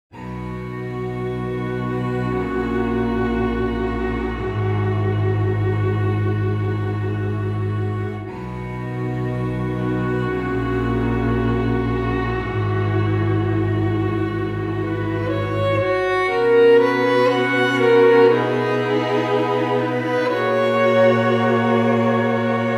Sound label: Solo Strings; Little Magic Flower; Samplemodeling Solo Strings; 2CAudio; Precedence